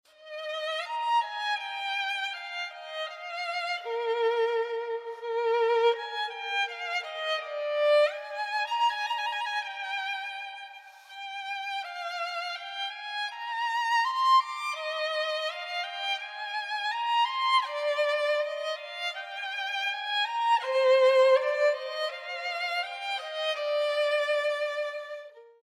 Violino Stradivarius

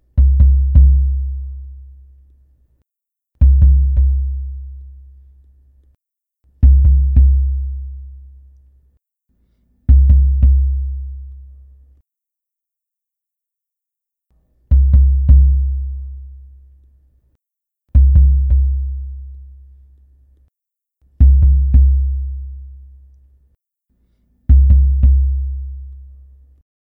TAMBOR SURDO Toque solto

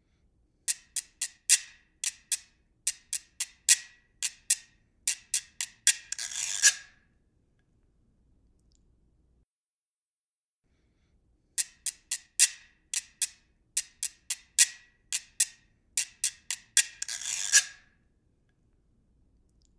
RECO RECO reco reco agudo

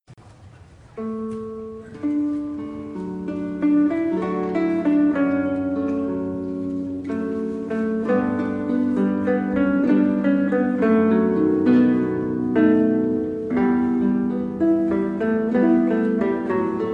Cítara

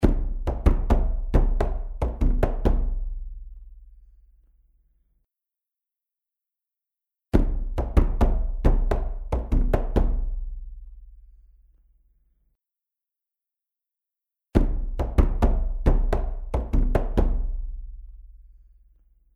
CAMISÃO GRANDE E PEQUENO

